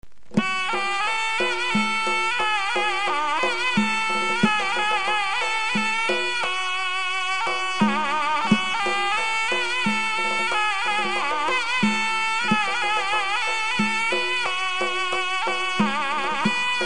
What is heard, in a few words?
SURNAY, Bir ollohim